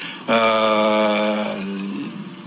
eeeeehhh

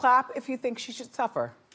clap

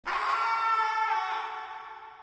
scream; silent hill 4

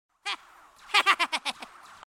laugh; cartman; dog whisperer; south park

